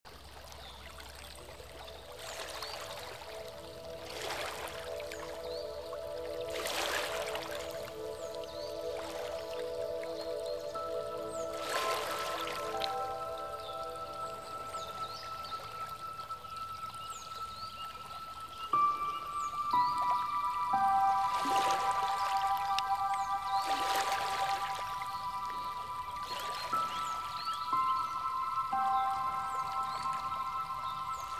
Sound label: Music for Yoga, Meditation and Relaxation; Ambience